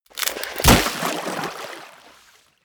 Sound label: frozen surface break